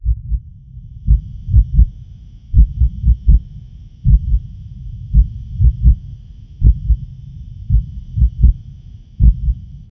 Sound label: deep tense horror game sound, shaky